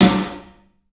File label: PA DEEP L, CLUB SN VRB; 3D Sound; 3D Drums